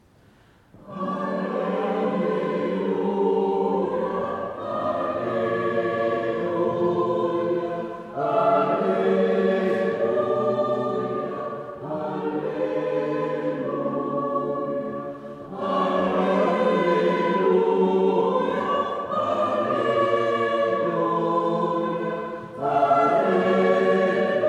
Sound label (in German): Zwischengesang, chor; Osterfestgottesdienst 2024